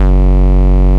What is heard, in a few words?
Ultimate Bass Root F#; Vengeance Samples